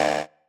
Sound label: marimba bike